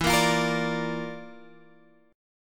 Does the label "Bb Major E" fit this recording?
Yes